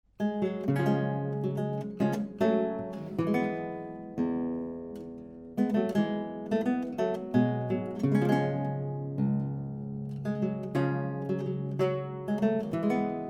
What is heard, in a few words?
Romanesca Schoeps MK2s; Oktava omni; FreeJazz